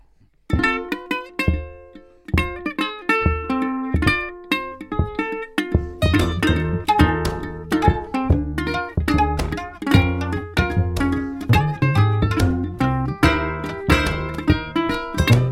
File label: CHRISTMAS BLUES